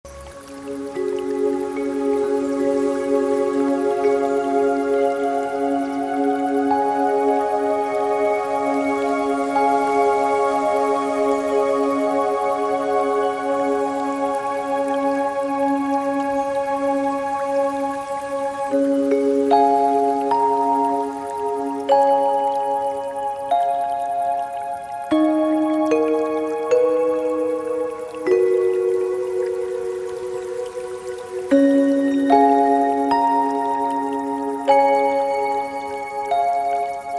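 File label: relax, sounds of health; praktika zvuki zdraviya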